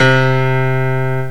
PIANO; GMPlay

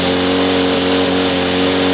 audi engine; WRECKAGE

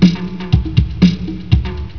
drum pat

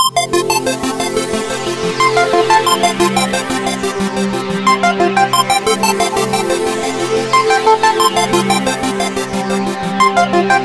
synth; Cubase Magazine 46; Thatcolour

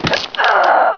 land hurt; PC Gamer IT CD 41; Daikatana; mikiko

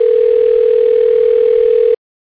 Remote Ring; Phonepak V2

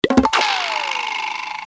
Bonks; GEOGRAFIA

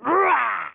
damage; Dark Eden Beta Test

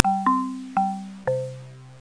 Wait Loop; Interview Skills of the Future

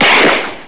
Gunshot

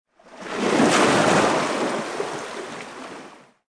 LAYWTER1, interface

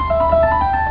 Tubular Sound; AutoCat

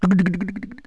wood thud, cartoon; Wired for Sound Pro CD; Aristosoft, Inc.

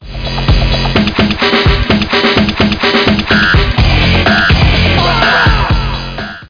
Electronica Open; 250 Desktop Themes

